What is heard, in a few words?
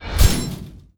Bell Rise